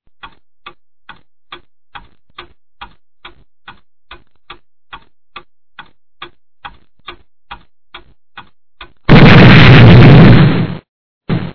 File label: TIME BOMB